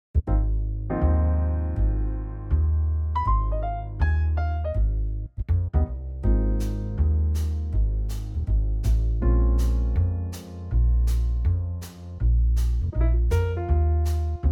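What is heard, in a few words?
Ab to Db trio, Backing Tracks for Male Vocals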